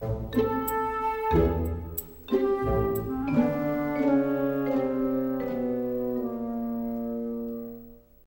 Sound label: sting fail; The Sims 3; Windows